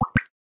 Drip, notifications; Motorola; Edge 70 Max China

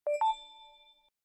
Success

